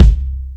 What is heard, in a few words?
low kickdrum sound c key